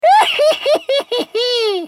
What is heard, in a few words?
laugh; Corki; Character Voices - English